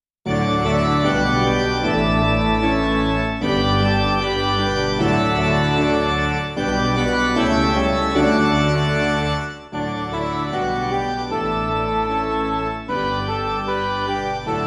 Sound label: midi